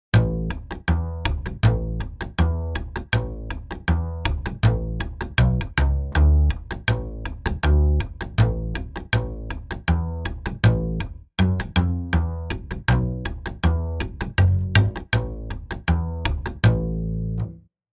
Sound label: slap palm palm; roots urb